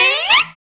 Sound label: GTR, Dirty Efx; Club-50 - Foundations Roland